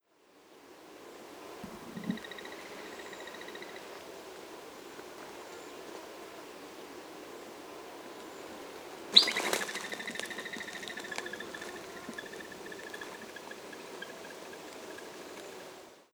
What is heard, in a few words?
whistle pig, groundhog; whistle pig short